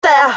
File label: dow; ooh ahh